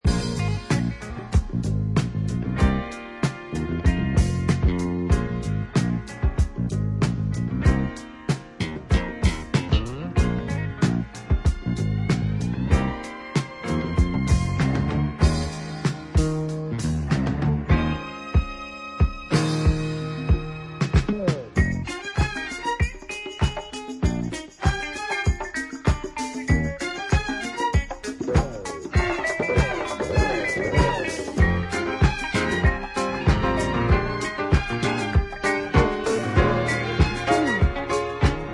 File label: low slung disco